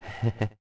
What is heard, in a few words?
Heheh; Grog